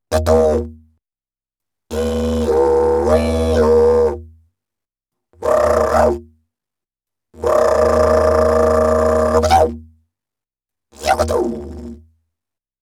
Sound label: Didgeridoo And Overtone; Good Stuff Audio